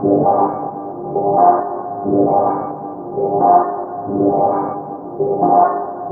ORCHFX, orchestra, Asian Traditions

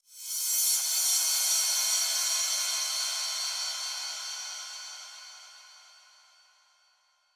PWM Kit 142 BPM Reverse Crash; Vengeance - Essential Clubsounds Vol.5; Demo Constr Kits; Root Gmin

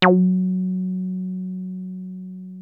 303 D#3, TB 303

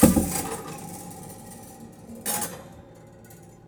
Prepared Piano; Battery 3 Sample Kits

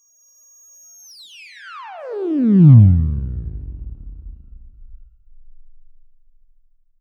FALLING, SYNTHETIC; AKAI Anime Sound FX

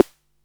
EH32 SD, Electro-Harmonix DRM-32

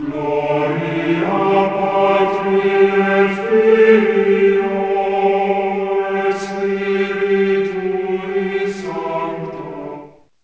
CHANT, OTHER SYNTHS; WS-CHANT; Rubber Chicken Software Sample Bank

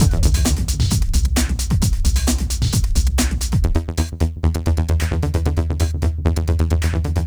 Risen Up, 132 Emin, Hardcore Breakbeat; Big Fish Audio; Hardcore Breakbeat Action

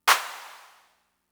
Clap; Fricke MFB-522

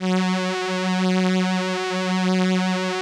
VP-330, String Machines

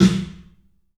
CLUB SNARE; 3D Sound; 3D Drums

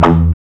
GTR WAH; AMG - Now CD-ROM (Roland); Art of Samplng

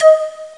beast flute; ST-XX Amiga Soundtracker Sample Packs

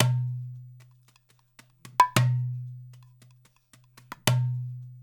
LOOP 7 L, Percussion; InVision Interactive; GRUNT

